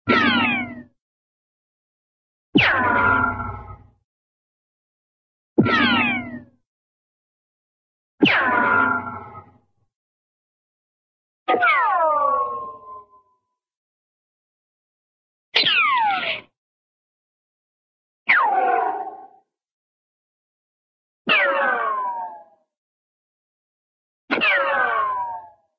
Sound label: ricochet heavy, hollow ricochet; cartoon